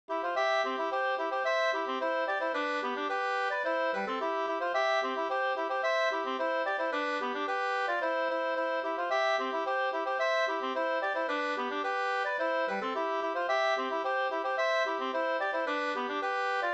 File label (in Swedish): folkdans, Tretur no Cdur; Strandvik